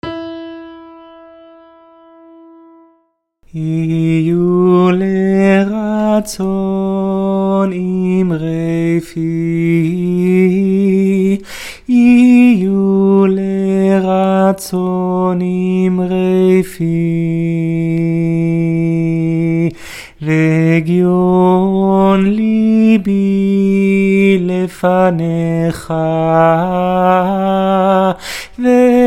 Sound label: yihyu lratzon tenor